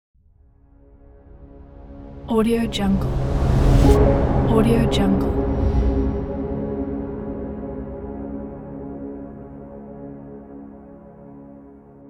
dark rise reveal

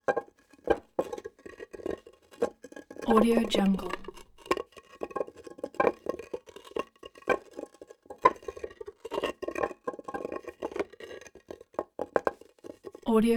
coral on granite; prosoundfx

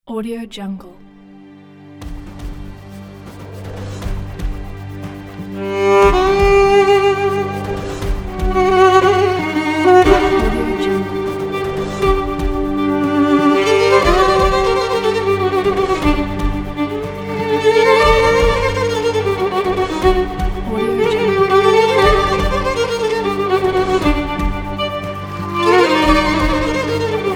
tense violin of the mountains

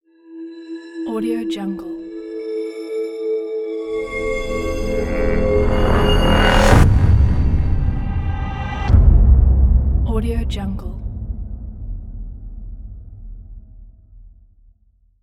logo action cinematic horror

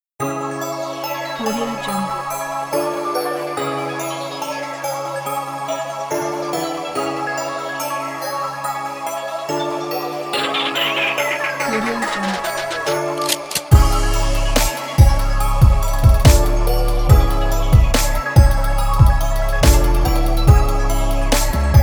commercial trap; publicitymaster

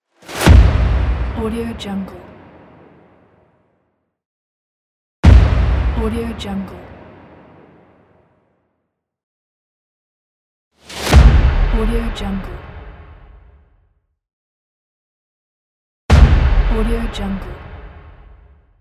boom hit

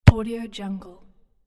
single soccer kick

